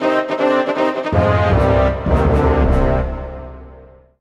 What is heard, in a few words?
Orchestral Brass Negative; MUSIC EFFECT; startide